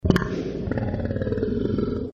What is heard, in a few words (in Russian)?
Рычание динозавра